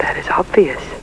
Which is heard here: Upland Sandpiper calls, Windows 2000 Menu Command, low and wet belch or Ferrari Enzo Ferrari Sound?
Windows 2000 Menu Command